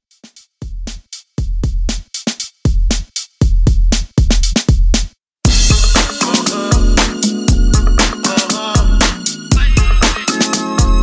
Old School 118 Bpm; Redrum

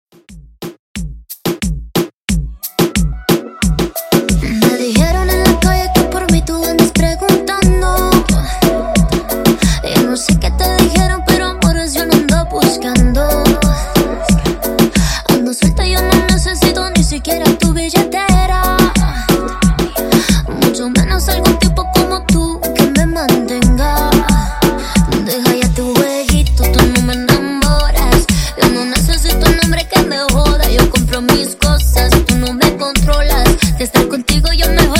Reggaeton 90 Bpm

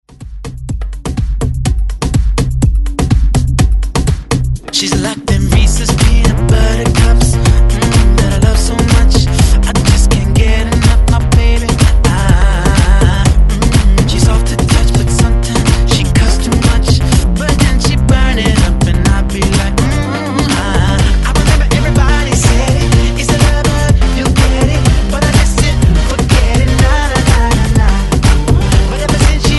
Re Drum 124 Bpm; DJDOLLS

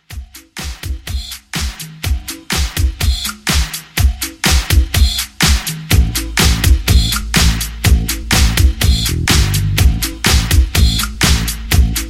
70s Alternative Re-Drum 124

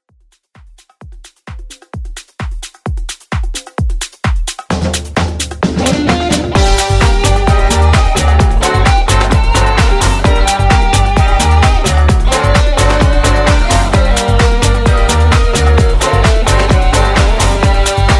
House Vocal

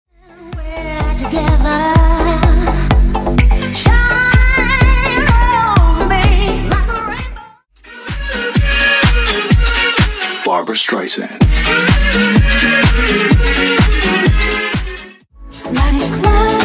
Dance House Throwback Megamix 126 Bpm Clean

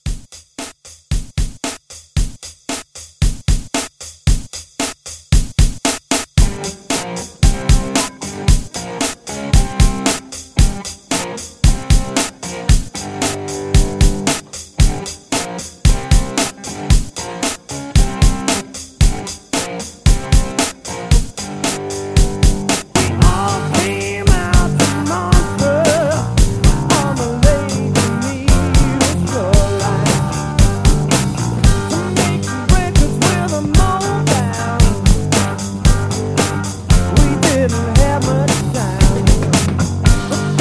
70s Classic Rock Re Drum 114

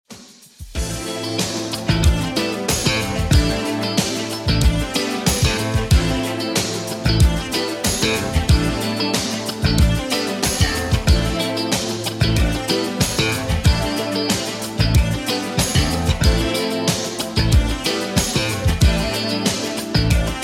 2ks Redrum 93bpm

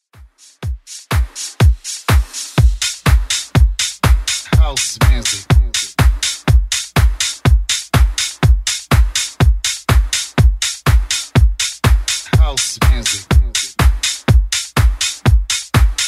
House Music 90s, 123bpm; Redrum

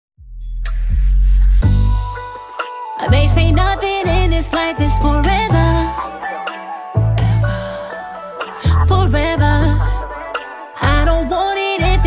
62bpm; Redrum

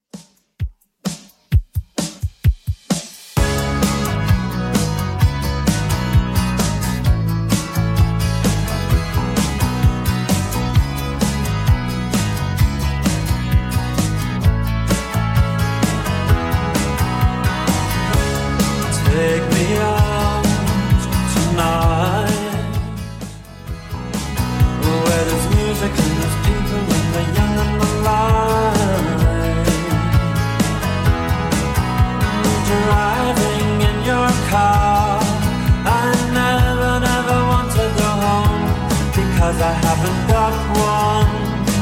80s Redrum 130bpm CLEAN

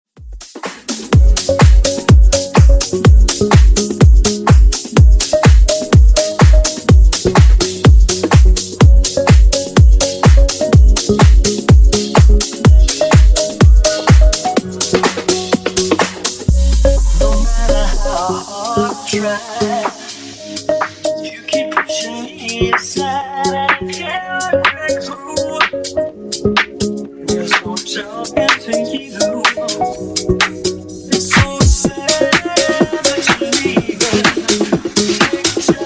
Funk 125Bpm

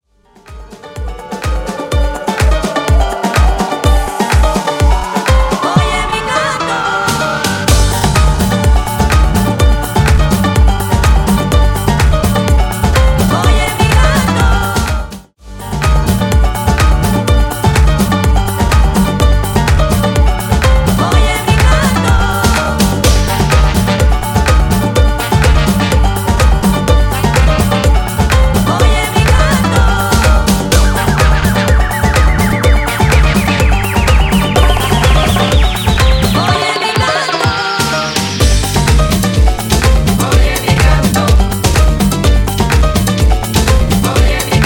Afrobeat 125bpm

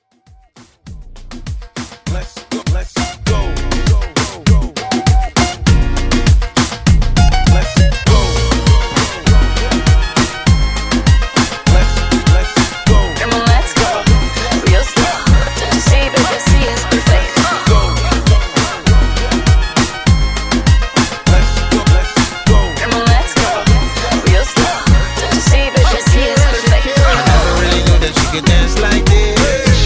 House Transition

